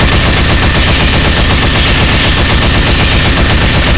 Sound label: gatling shoot crit; tf2